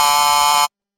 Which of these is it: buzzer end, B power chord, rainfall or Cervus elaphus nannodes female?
buzzer end